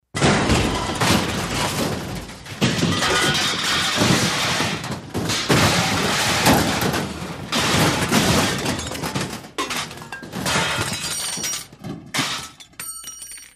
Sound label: Gigantic Junk Collap, crashes creaks